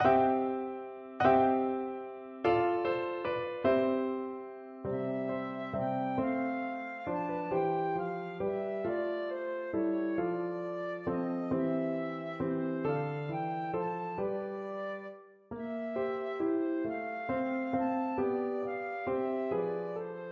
flute

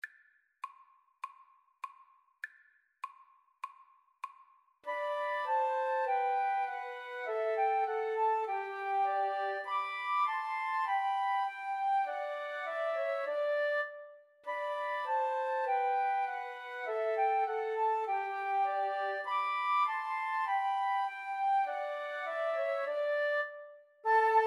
Flute Trio

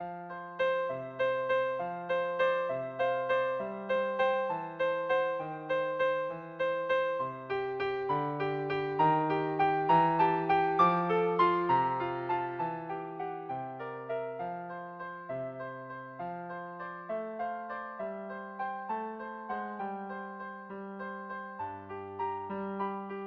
2 violins cello

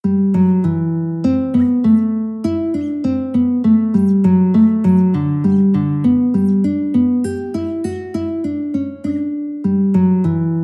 The Haunted Castle guitar

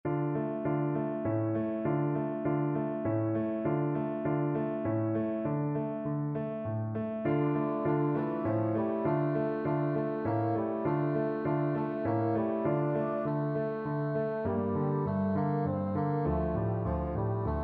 a la nanita nana, bassoon

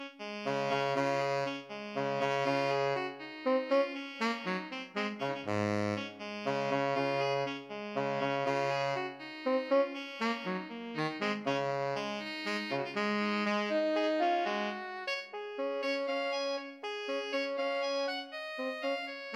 alto tenor sax duet; beethoven

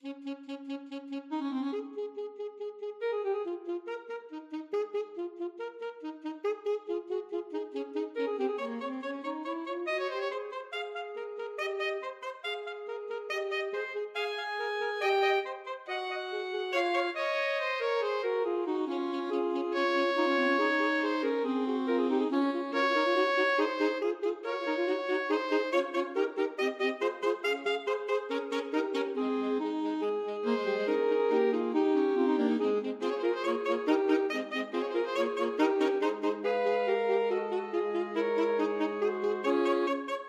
alto sax quartet

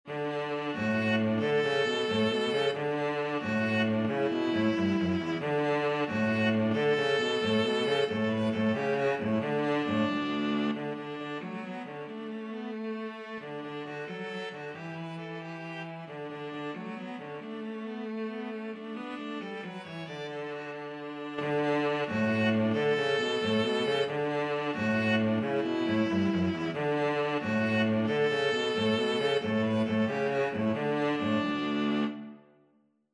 looby loo, viola cello duet

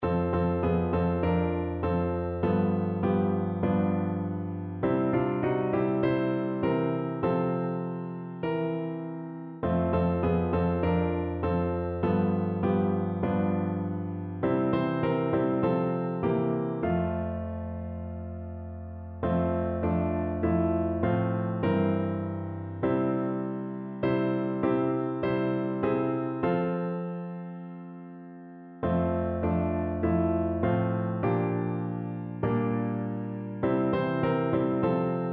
keeps me singing, piano

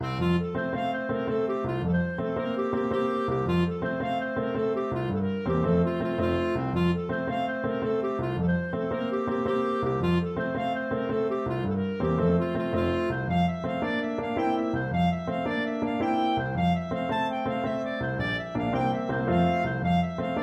give us a drink clarinet

